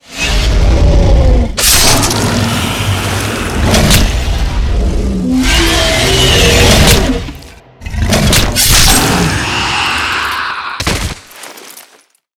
npc kill 1hm kill move dragon rodeo stab

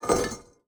urn large close; skyrim

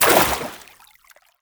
water sprint; skyrim

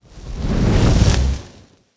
fire charge; skyrim

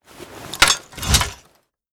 axe 1 hand draw; axe2hand; equip

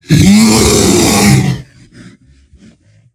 bear aggro warning; npc

